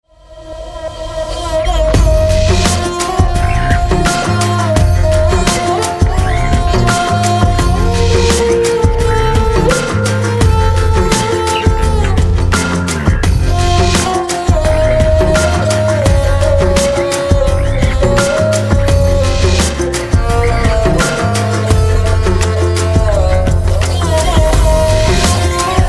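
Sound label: eastern chill trap; Восточный Chill Trap; Рэп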